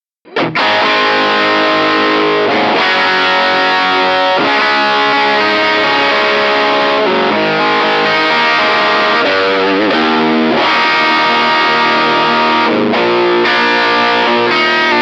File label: britannia direct, Gain 17, Treble 17, Bass 7, Brilliance 17